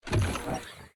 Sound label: popup up; ui; cangmen; lineageos; vayu 19.1